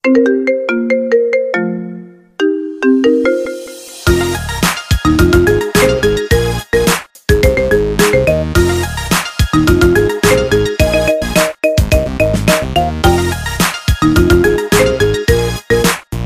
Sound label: marimba remix ringtone